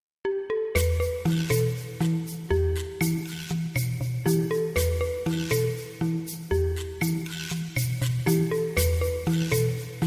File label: iPhone 7 Plus Ringtone; ringtones